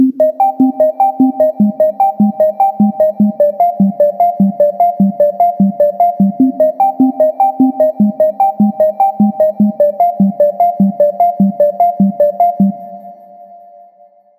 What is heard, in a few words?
Arp Cmin 150BPM; free melodies